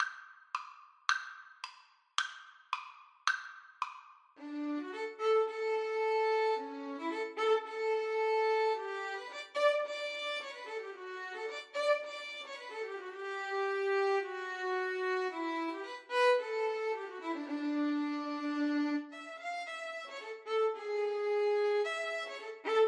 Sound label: violin duet